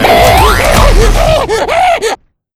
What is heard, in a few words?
tone execution; tone execution part 01